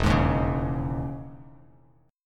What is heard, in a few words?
E Major 13th down Bass Standard; EM13 strummed; JGuitar